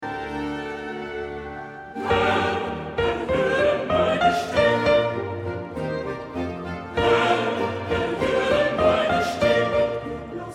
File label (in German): Bach Aus der Tiefen, Sopran